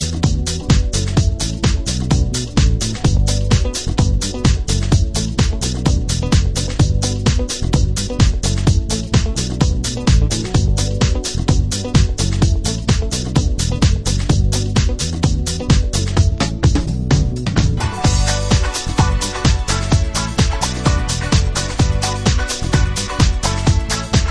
club instrumental mix; disco

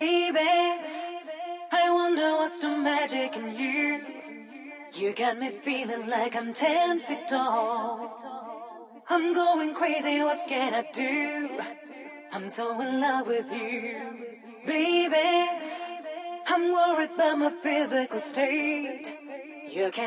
a cappella fx; disco

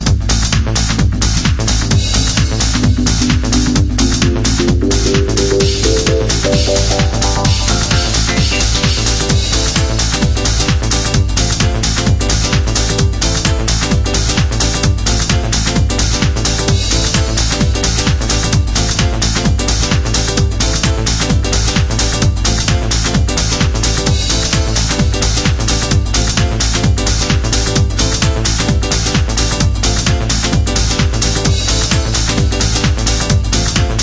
power piano verion, disco

